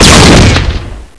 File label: explode